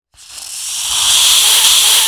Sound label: jumpscare; Garry's Mod; hungry snake